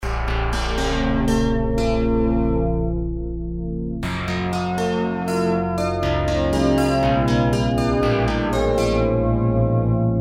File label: Keyboard